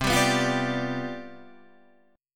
C Major 7th Suspended 2nd Suspended 4th C down Guitar Standard, chord; CM7sus2sus4 strummed; JGuitar